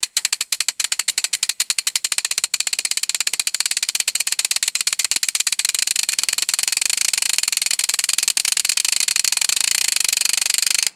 fast repetitive mechanical ratcheting accelerating